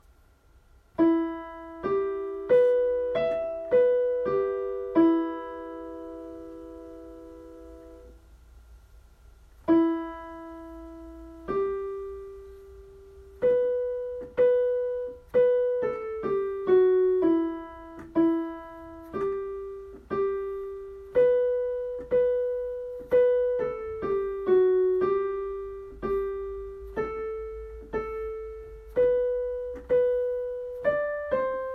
Диктант ми минор

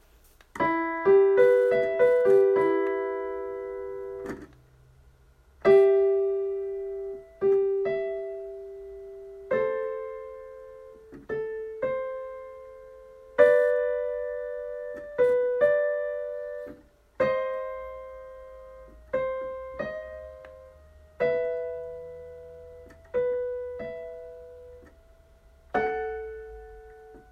Интервалы ми минор

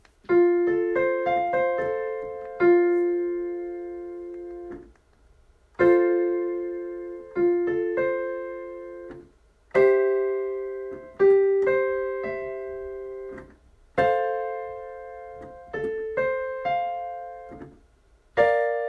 Аккорды фа мажор

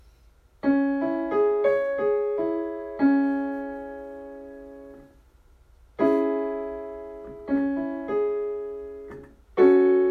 Аккорды до диез минор

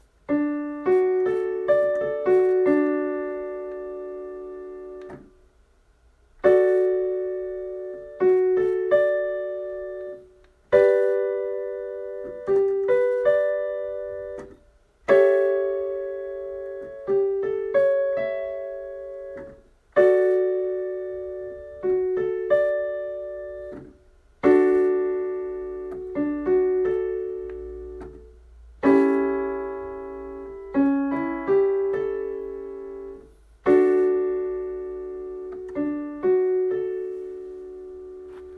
Аккорды ре мажор

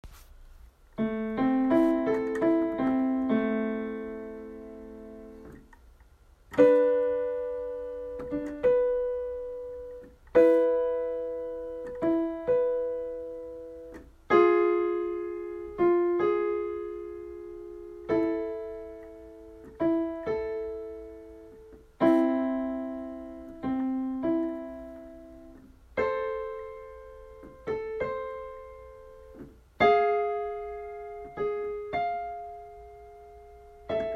Интервалы ля минор

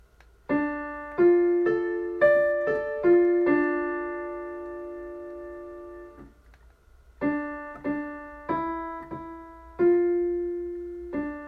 Диктант ре минор

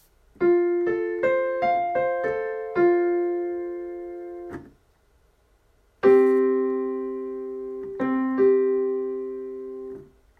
Интервалы фа мажор

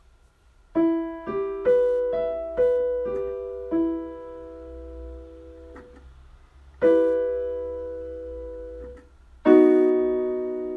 Аккорды

